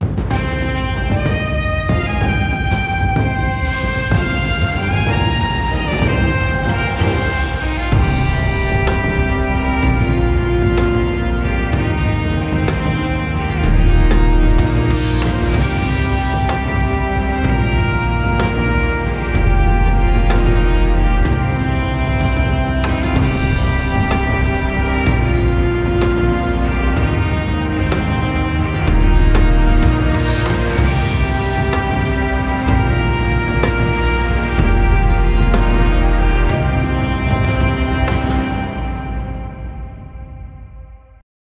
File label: Combat Generic Victory; Grimshade